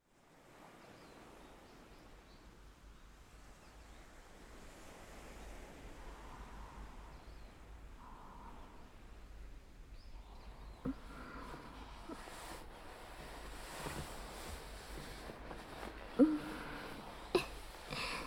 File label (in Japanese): お掃除フェラ; おはようのお掃除フェラ